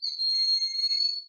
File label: Broad Winged Hawk